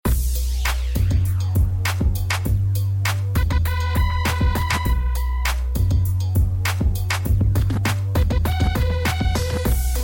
hiphop

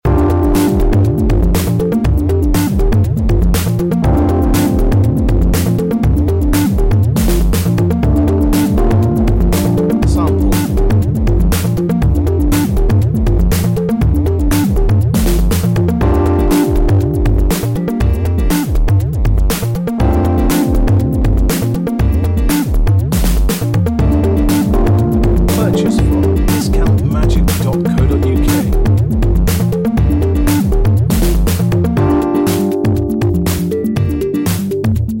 breakbeat

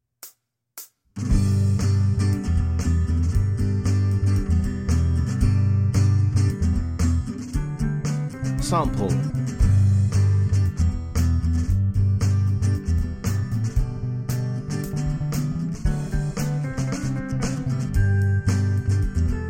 Acoustic Joy

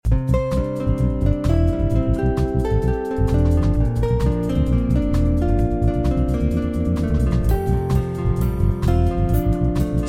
slow chill beat, backing

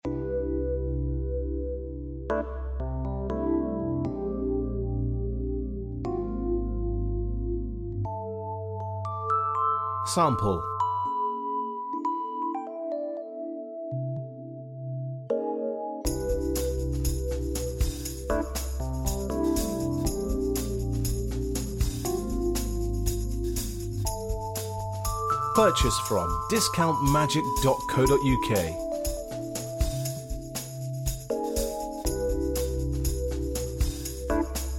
beat

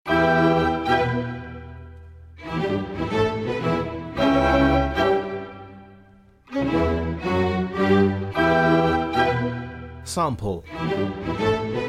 classical

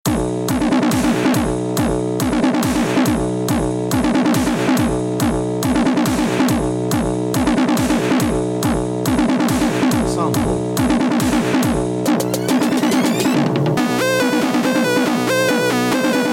dubstep, backing

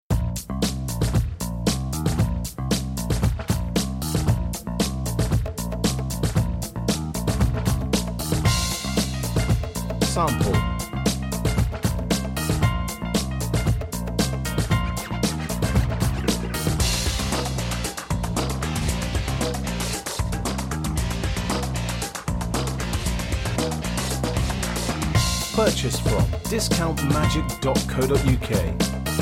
groovy funky

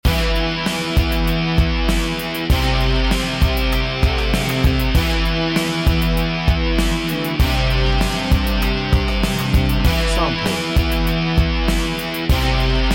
rock synth remix; remix rockdasynth

